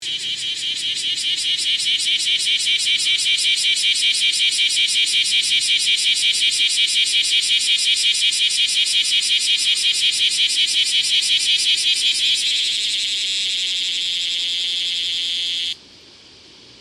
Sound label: cicada kumazemi